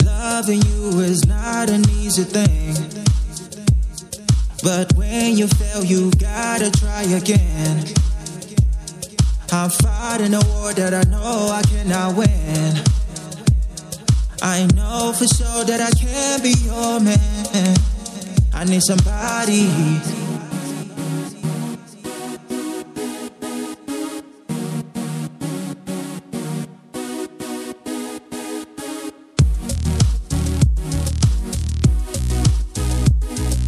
Disco Chill Vibes

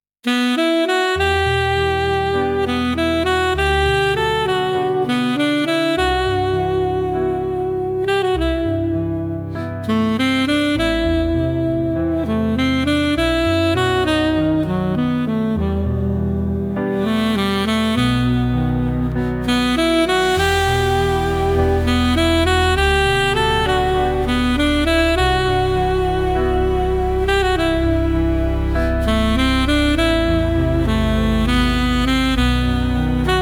golden sax vibes

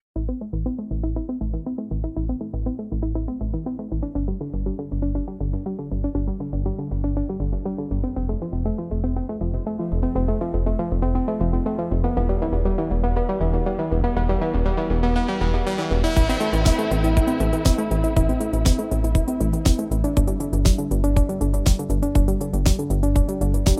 Electronic Impulse

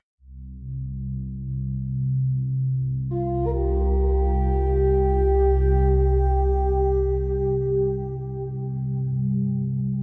Gentle Woodland Path; Quiet Glade

